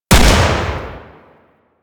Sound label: Double Barrel Shotgun Shot; Warframe; Tenno